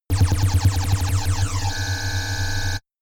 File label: shot ringing wobble charge; pulsieren